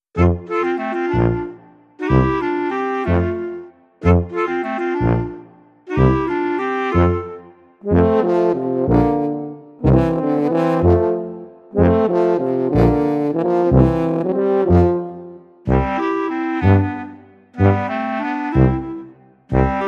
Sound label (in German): Klarinette